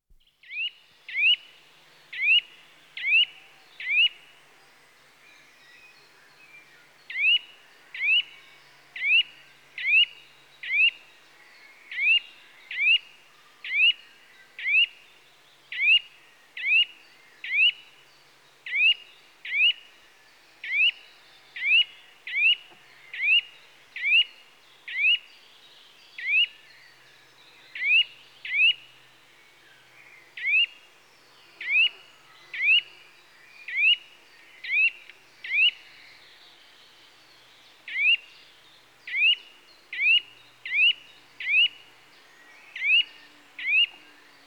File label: Nuthatch (Sitta Europaea) Calls; Birds; The BBC Sound Effects Library